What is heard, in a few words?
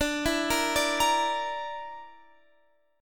D Suspended 2nd Sharp 5th D